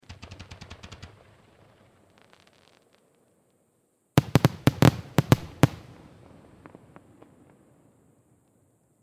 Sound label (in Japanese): 花火の音 連射; firework